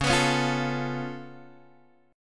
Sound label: Db Augmented 7th Db down Guitar Open G